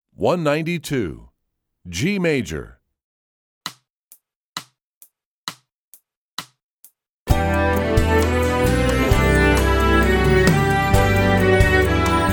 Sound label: G Major, Strings; EE2000 Book2